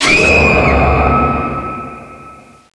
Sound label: spell skeletons horde cast